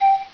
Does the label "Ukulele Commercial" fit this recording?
No